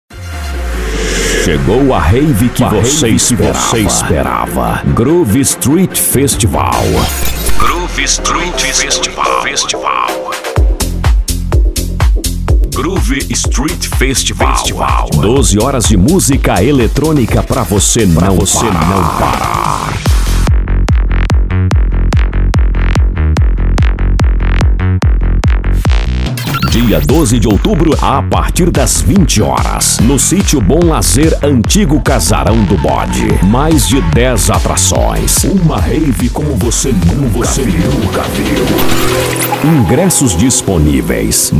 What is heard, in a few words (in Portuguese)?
groove; studio boneco producoes